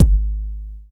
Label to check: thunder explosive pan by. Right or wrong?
Wrong